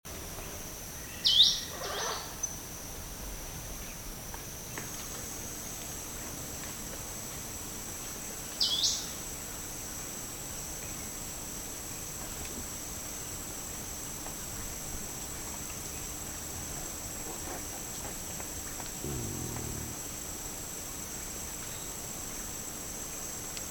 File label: forest elaenia; پرنده الینیای جنگلی